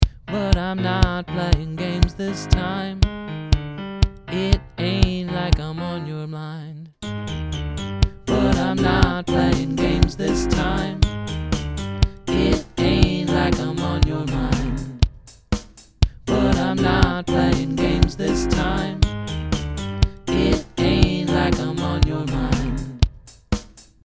1st scratch

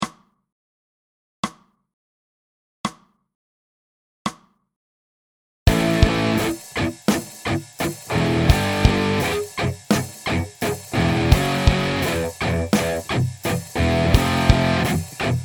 clicks half with drums

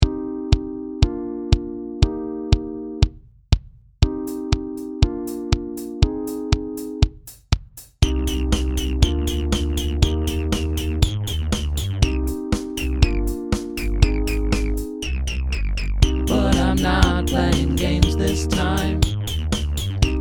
bass

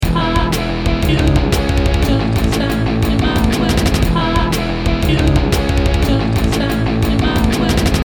dist guit voc

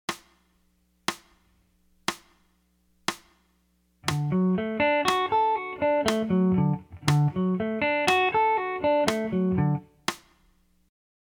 arpeggios; chords

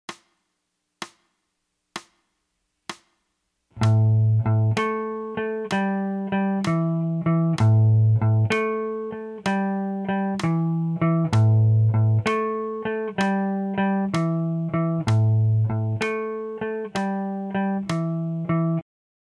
12 bar blues

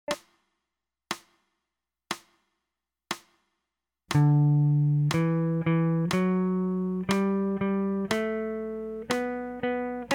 d major up down; scales

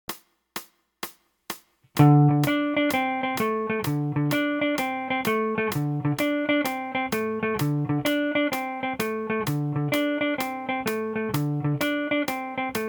blues, 12 bar blues

